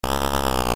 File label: roar; gau; ff3; ff3us